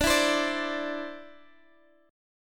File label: D 7th Suspended 2nd, Guitar Drop D